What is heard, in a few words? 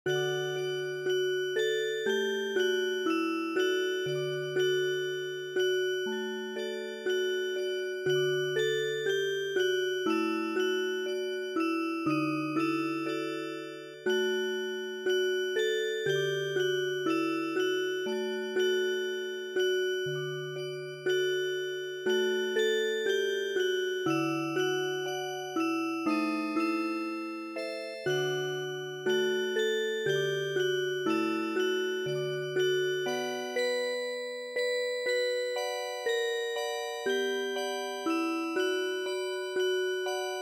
turkish fishermans song Music Box